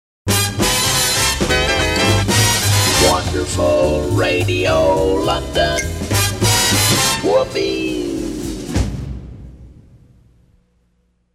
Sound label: Pirate Radio London Jingles; Radio London Whoopee!!!!